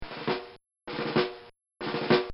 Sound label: Military Drum Roll; Military Sound Effects